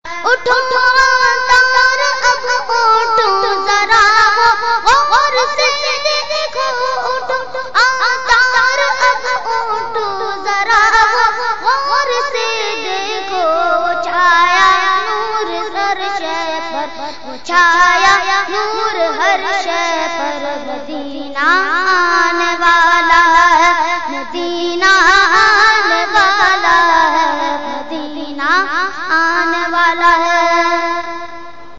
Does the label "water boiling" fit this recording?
No